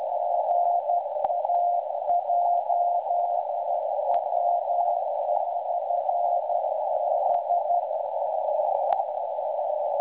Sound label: websdr estonia 3579.1kHz, Beacon recordings